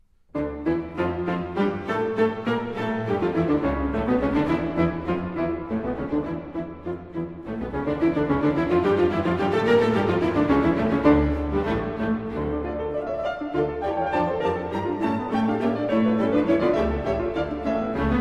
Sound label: Concerto In D Minor For 3 Keyboards Allegro; Bach; BWV 1063